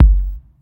hot rap kick g key, Royality free steel kick drum sample tuned to the G note. Loudest frequency: 103Hz